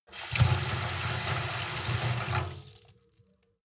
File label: sink fill with water medium; industrie-wirtschaft; gastronomie